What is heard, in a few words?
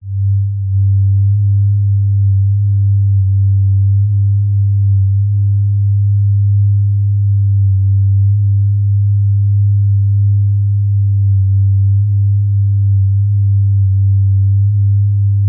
futuristic bass drone magic spell cast conjure craft mobile app special